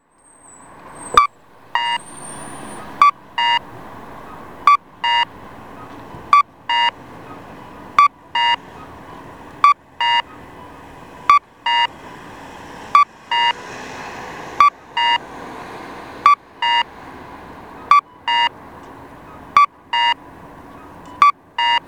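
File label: cuckoo, traffic signal; nagoya U-B; onkyo kosaten; aichi; banshojihigashi; kakko; naka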